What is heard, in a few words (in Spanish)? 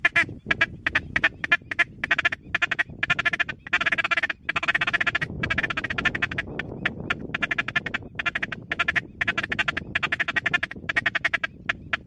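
llamada alimentandose, pato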